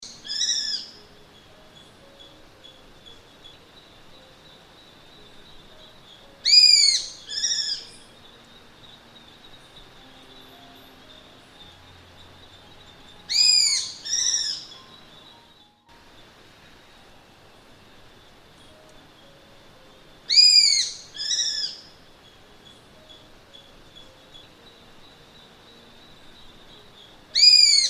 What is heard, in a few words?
Mystical Owl Hoot Calm Night Forest Sounds